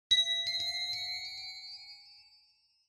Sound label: Ding Delay; Onoma